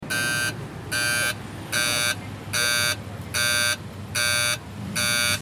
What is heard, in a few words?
alarm